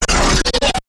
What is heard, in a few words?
Short Stutter